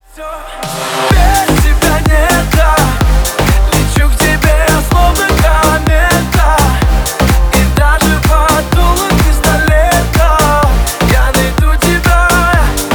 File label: sax remix